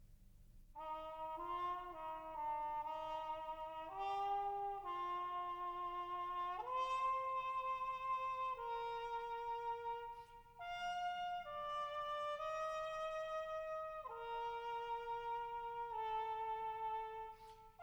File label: Concerto For Jazz Drummer, Percussion Ensemble